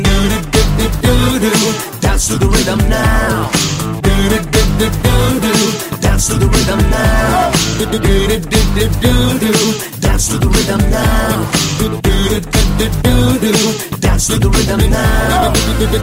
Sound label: Dance To The Rythm Now